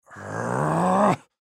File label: Growl Angry Short Male Fifty Yrs; Pain; Human Growl; Blastwave